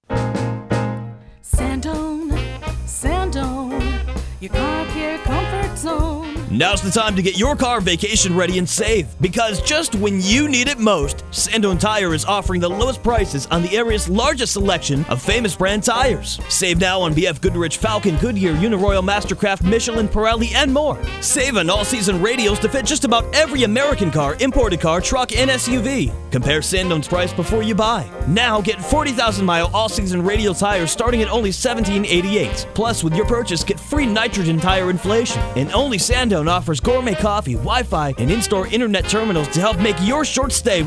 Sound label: Tire Jingle; Sandone